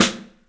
STEEL S, drums; 3D Sound

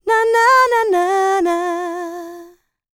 LICKS, Vocal Planet, R&B; ILIO; Dance; LT R+B

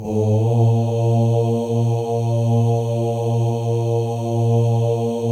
male ohs; Spectrasonics; Symphony of Voices

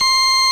60's Organ

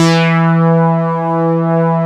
OB FILTER E3; E-MU Formula 4000 Series Vol. 3; Analog Odyssey

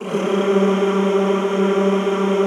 G2, Classical Choir; Best Service; Extended Classical Choir

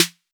Snare, Roland TR-808

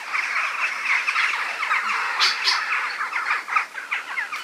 Birds Various

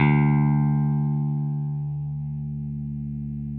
6STR BASS, D; Sampleheads; Whole Lotta Country 1 & 2

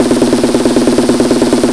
sdr 138, Techno, snare-rolls; Techno ID; drum-loops

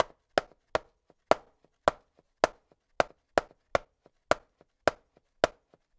junkyard rhythms, drums

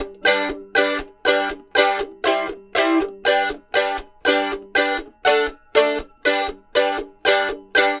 Mandolin Chuffs; Appalachian; Continental Drift Aftershocks

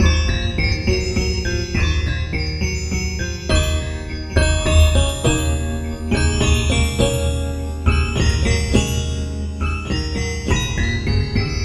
Oriental Delay; Sonic Foundry (Sony Creative Software); Ma Ja Le Ethereal Textures

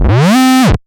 Misc Short FX

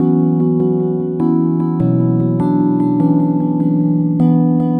Hi Pluck Echo, Synthetic Pulses

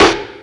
rim, snare kit; Big Beat Samples & More; AKAI

